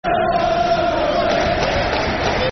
We Are The One And Only Wanderers, Football Chants; Bolton Wanderers Bwfc